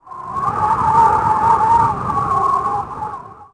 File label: WIND; Urban Chaos